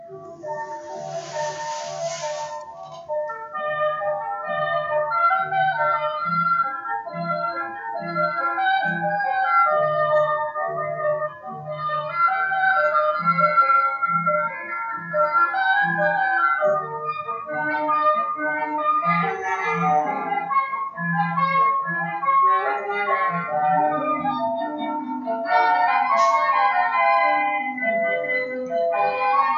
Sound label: folk instrumental